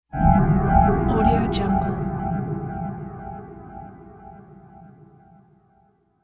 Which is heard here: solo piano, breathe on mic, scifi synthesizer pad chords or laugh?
scifi synthesizer pad chords